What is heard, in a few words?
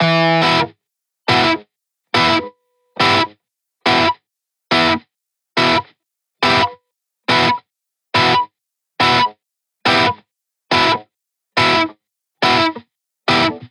140BPM Emin Guitar DRY, Offbeat Dub Guitars; Antidote Advent